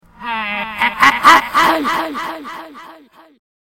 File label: laugh; hitler